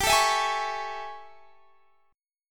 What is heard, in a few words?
A Major 7th G